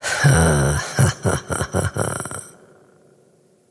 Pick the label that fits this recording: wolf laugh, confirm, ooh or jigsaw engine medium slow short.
wolf laugh